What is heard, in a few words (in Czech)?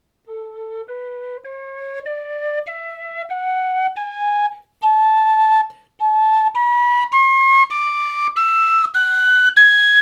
Pastýřská píšťála A dur